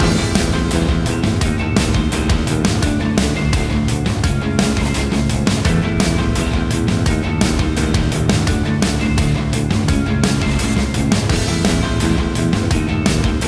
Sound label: BGM Kowloon Street